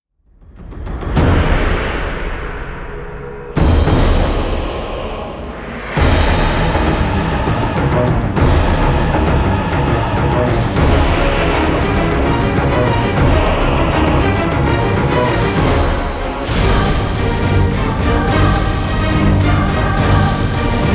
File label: Trailer Battle Action